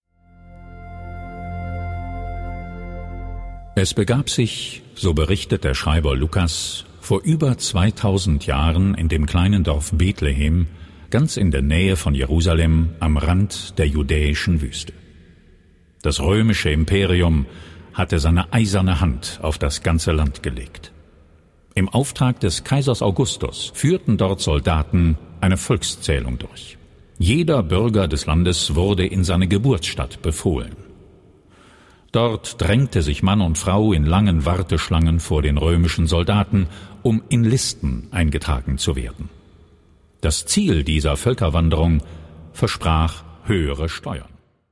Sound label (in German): Zwischenmusik